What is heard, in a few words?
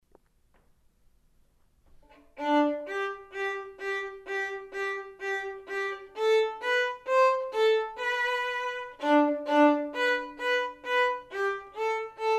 vln perf; Building Technique with Beautiful Music, Book 1 Violin